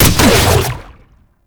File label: Archgun Shot; Warframe; Weapons; Archwing; Tn Nokko Archgun